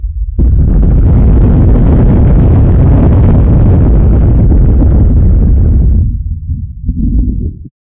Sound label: a deep rumbling thunderstorm rolling across the sky